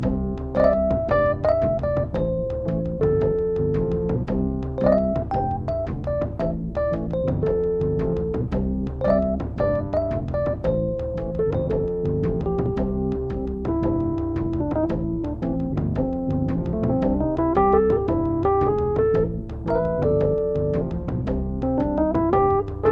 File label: Tribal Lands